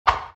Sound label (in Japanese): syuriken